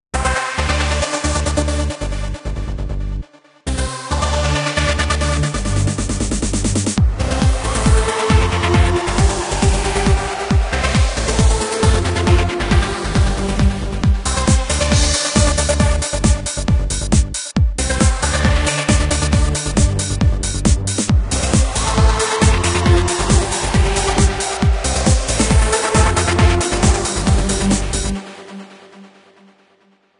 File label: Trance Up; ToneRack